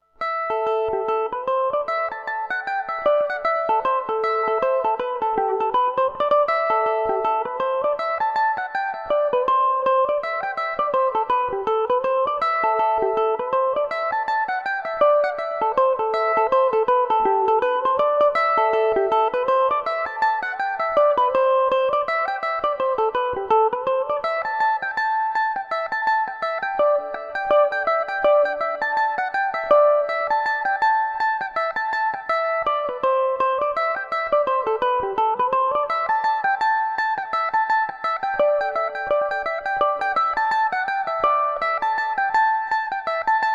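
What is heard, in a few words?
reel A dorian revelation, Irish mandolin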